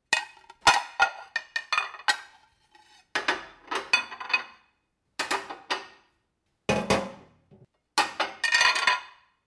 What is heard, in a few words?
Dishes; Machinal